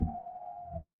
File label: feedback fail